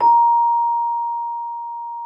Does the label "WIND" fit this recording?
No